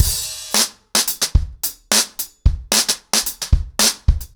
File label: 110BPM, ACOUSTIC DRUMS; The Roots Drumloops